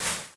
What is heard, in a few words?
Lava Damage; MiSide